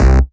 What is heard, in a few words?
Basses Root C; Vengeance - Essential Clubsounds Vol.4; Synth Shots and Basses; Note C